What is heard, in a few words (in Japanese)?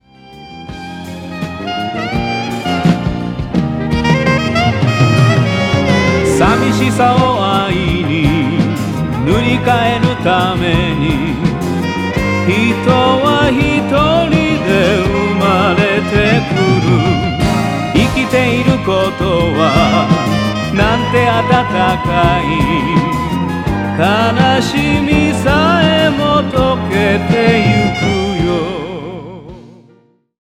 kayoukyoku